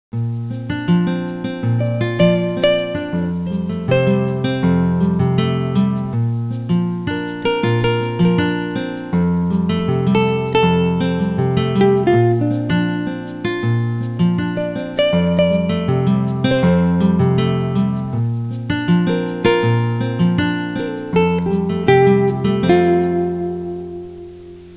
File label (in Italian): suonerie musica jazz